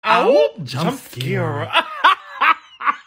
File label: awoop jumpscare hahaha